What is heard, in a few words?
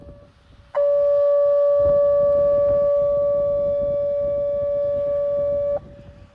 Testing Siren